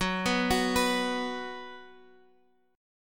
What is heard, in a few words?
B 5th Gb, chord